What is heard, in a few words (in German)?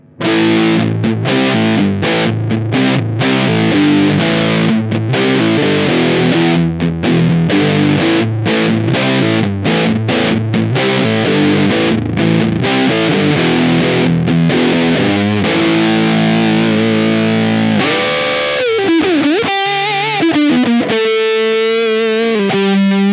Rams Head Clean Amp Alle Regler auf 12; J Mascis